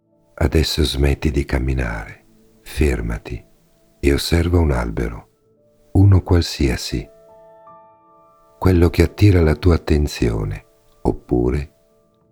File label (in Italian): Meditazione Dinamica NATURA